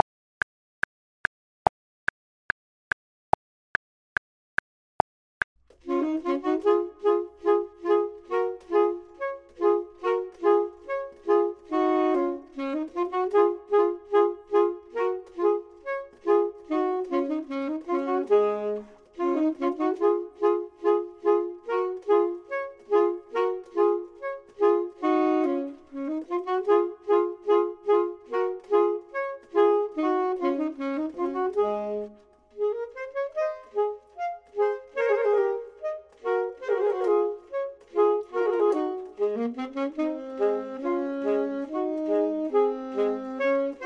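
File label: both, Saxophone and Clarinet; voxman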